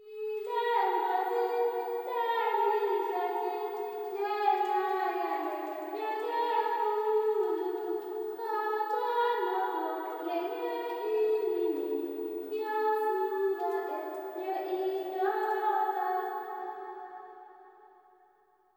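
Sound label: LITTLE GIRL, AFR. GIRL; Spectrasonics; Heart of Africa 2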